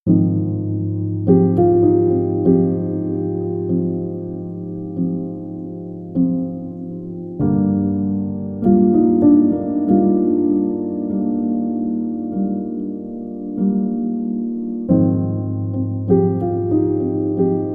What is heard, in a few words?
Sober Piano